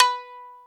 Shamisen B3; Planet Earth; Digital Sound Factory Planet Earth

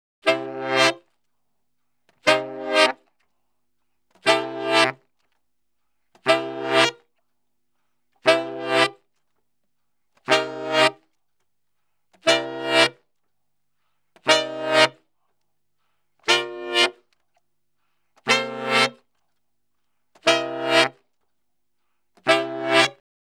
Salsa Picante; Masterbits; World Zone VOL-3

